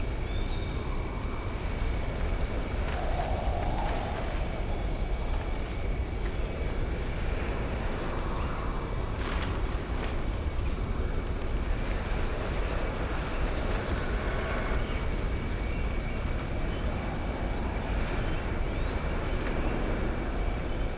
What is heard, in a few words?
Ambient